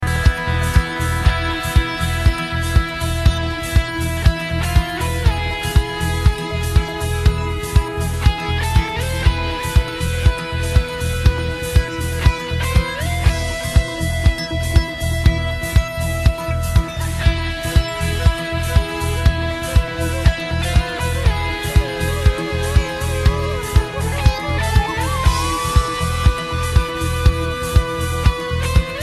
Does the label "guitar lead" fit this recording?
Yes